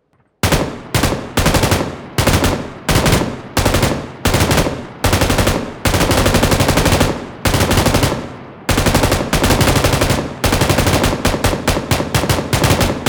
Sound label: M249 full auto